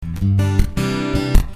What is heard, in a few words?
Punchy G,C 158